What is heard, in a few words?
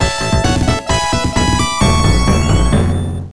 1-up; Sonic 3D Blast (Genesis)